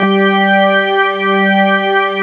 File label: ORGAN; East West - Phat & Phunky CD2; 90's Sample CDs